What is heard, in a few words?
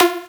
Synth Shot F; Mutekki Media; Vengeance Electroshock Vol.2